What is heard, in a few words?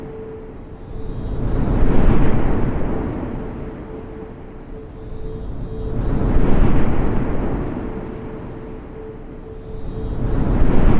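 GameOver Loop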